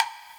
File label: Wood, Block Stick; Equipped Music; Loungin House